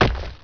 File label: drop thing; Heretic II Demo